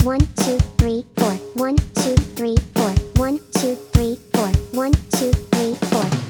backbeat rock

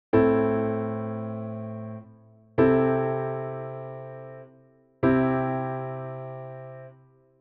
C6 and Am

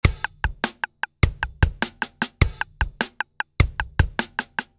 poly 3 4

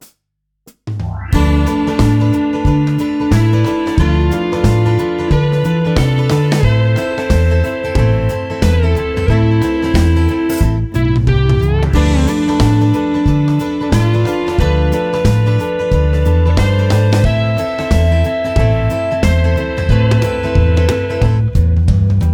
6th IIm6

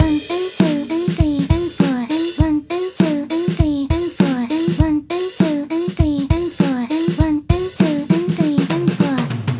beat 1 and 2 and, downbeat upbeat

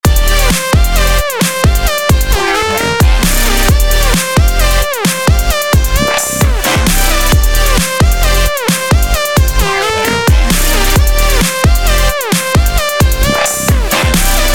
C5 parallel major II delta

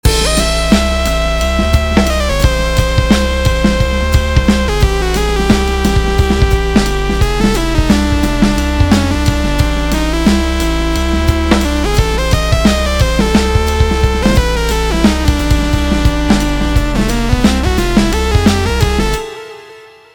chromatic jazz

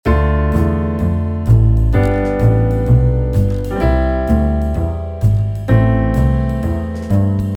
walking bass

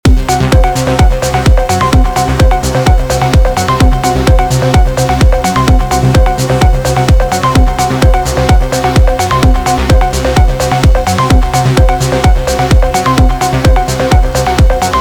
only aug4

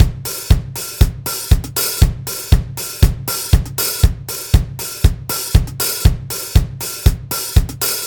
hat

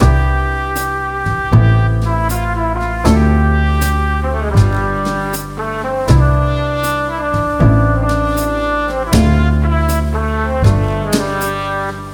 jazz 1625

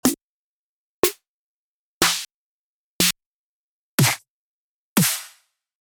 env snares

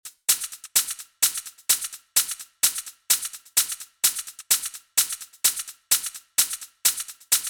bad hat shaker

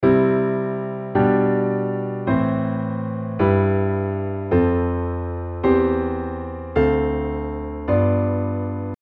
piano good

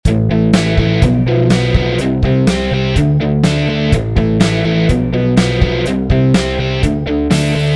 power chord

